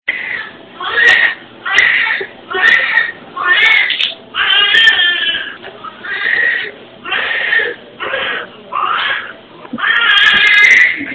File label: first cry